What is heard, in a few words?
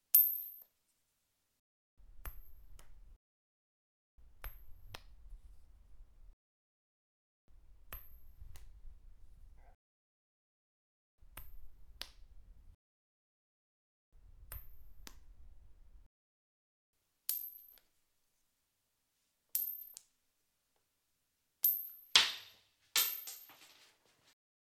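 coin tossing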